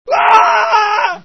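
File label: male scream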